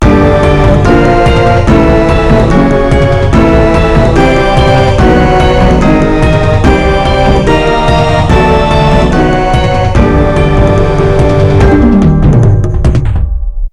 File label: hard set complete; Fremennik